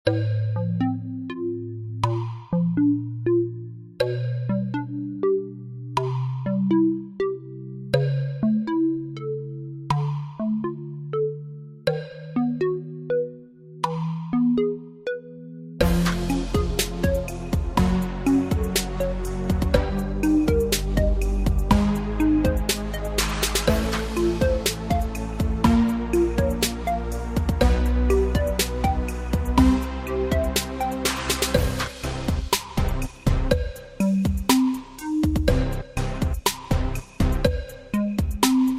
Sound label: In Game Music (60 Second Count Down); Kahoot